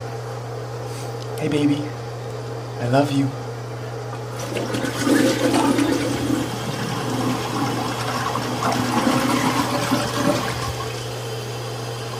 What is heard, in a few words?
toilet flush I love you